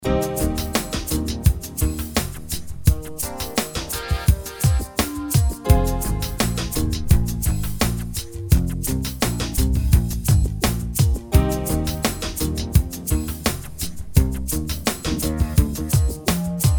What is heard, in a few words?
Corporate Espionage